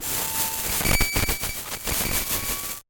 glitch databend; sonniss gdc

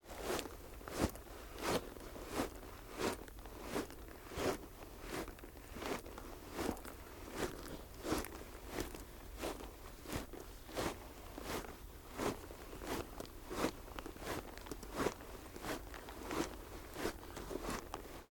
armor leather walk; Sonniss GDC

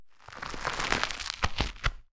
consumable consume food bite soft; food and drink; consumables

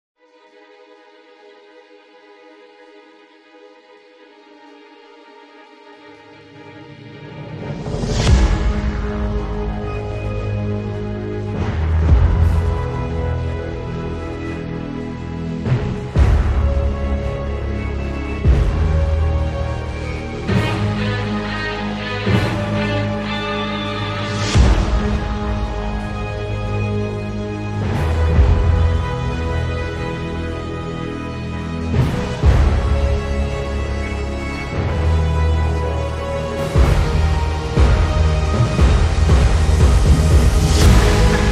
Karaoke

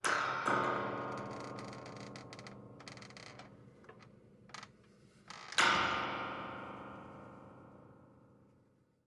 ambisonic screen door spring tension; fvtt sonniss gdc audio

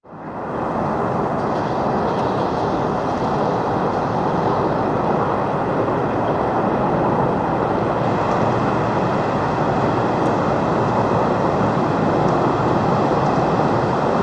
city day far highway rumble; sonniss gdc

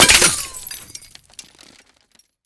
rupture car light impact; fvtt sonniss gdc audio

